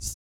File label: ELASTC TUBA, Vocal Blues Soul